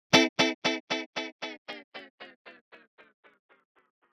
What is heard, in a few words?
tape delay